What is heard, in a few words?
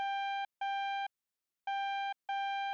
seatbelt